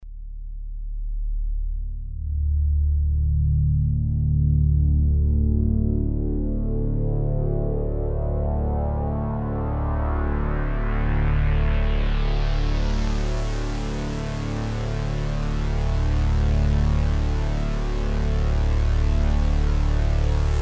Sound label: Lowpassfilter Oberheim